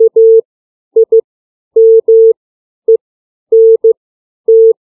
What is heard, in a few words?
CW alphabet